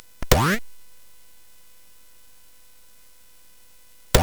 Sound Fx, CSG8580; hvsc

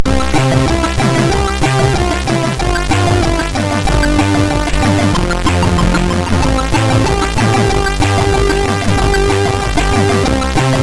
Old Music, MOS6581R2

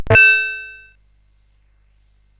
Tecnetium, CSG8580R5

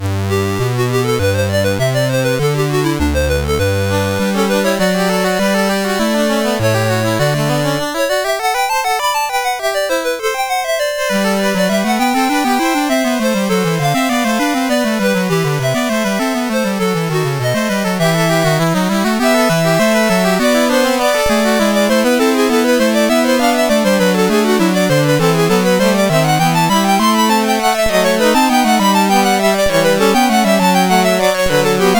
Sinfonia, CSG8580R5; Sinfonia 10

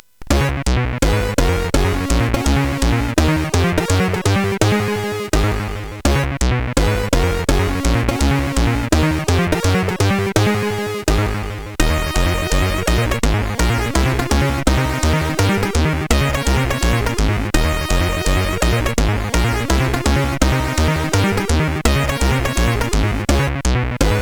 Jazz, CSG8580; Jazz Time; hvsc

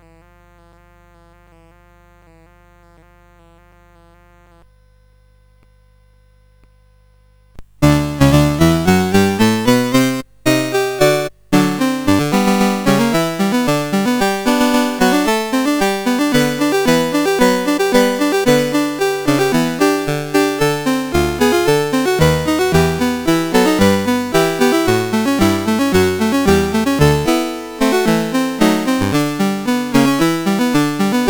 On Wisconsin, CSG8580R5

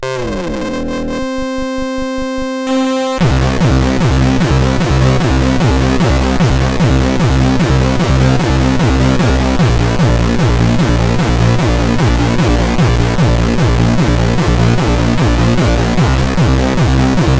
intro, CSG8580R5; Triad Intro 2013

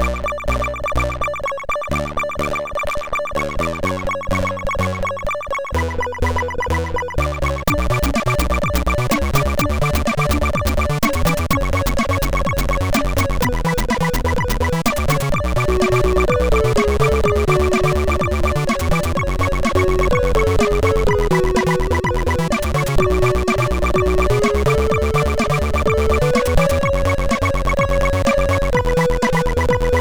sid MOS6581R4; Spandex; Get Lucy

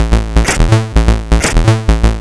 War Cars Construction Kit, CSG8580R5

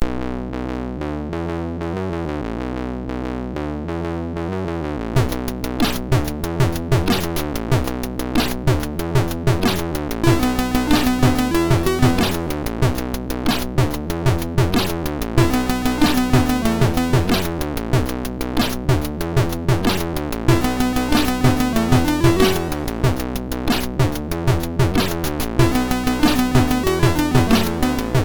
tune, MOS6581R3

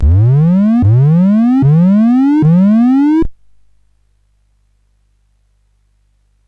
sid CSG8580R5, GAMES; Card Sharks

